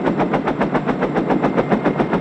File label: gyrocopter active